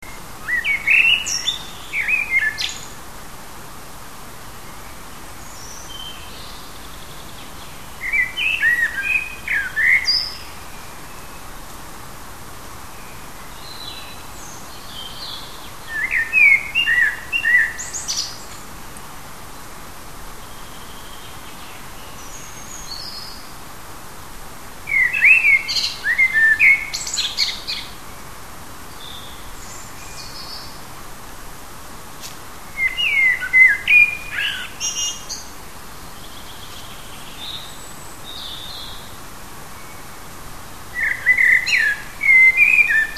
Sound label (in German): vogel heimisch; biologie; hecke; juni